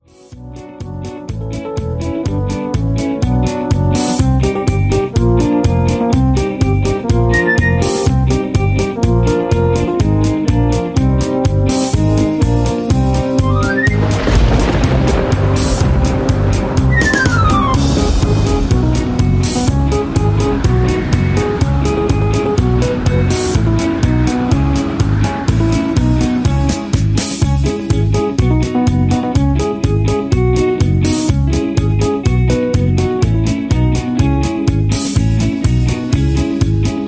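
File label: Instrumental with background vocals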